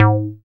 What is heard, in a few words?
Bass SH-101